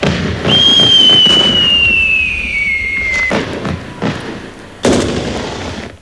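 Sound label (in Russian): фейерверк одиночный звонкий запуск ракет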